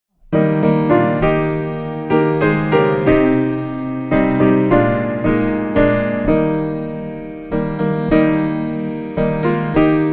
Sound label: Piano